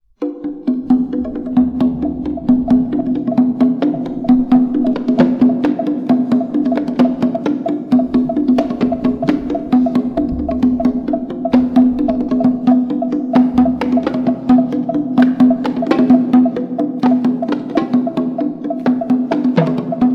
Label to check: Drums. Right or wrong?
Right